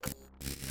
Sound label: win display